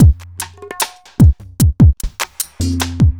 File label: evil beats 75